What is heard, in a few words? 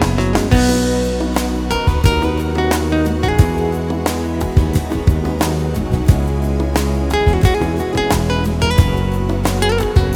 clipping; limiting